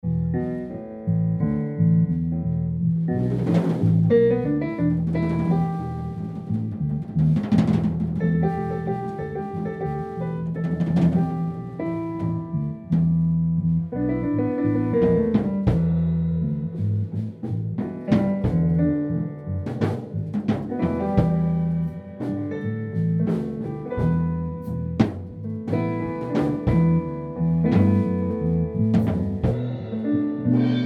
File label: E Minor Jam